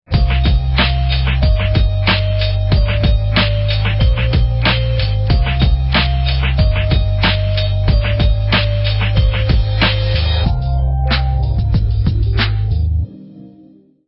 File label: Ambient; Motorola RAZR V3xx